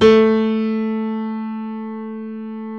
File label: piano; sound ideas